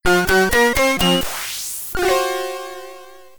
Jingle, Commodore 64; Nighthunter

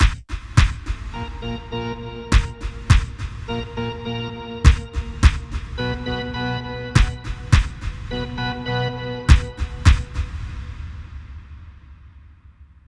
Ditty

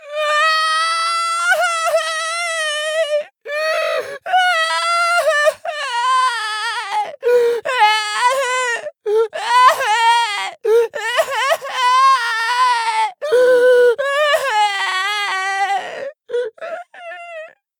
Crying; don t be afraid the first toy

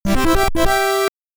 Press Start Button BGM; konami famicom chronicle vol.1 disk system edition; Domestic DISK Ver.

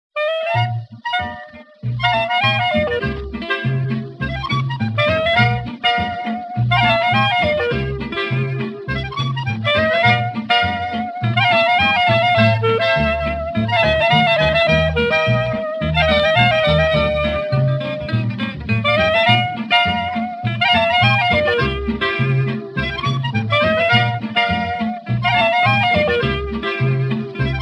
French Accordion Swing; Frenchy Bird; Wii U

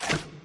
Click

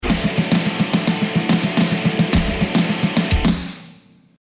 lost round

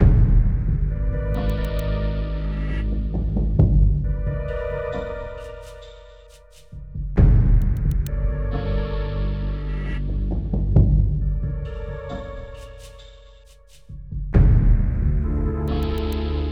BGM Amb District

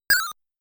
Credit Sound

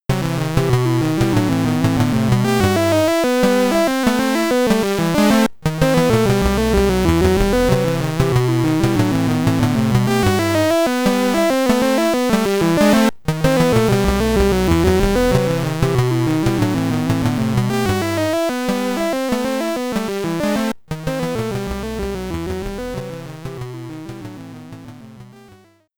Cave World (SID Stereo); Athena; commodore 64

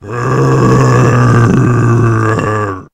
zombie infected

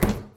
Drop Plastic Large; Lethal Company; Windows

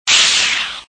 Speed Booster

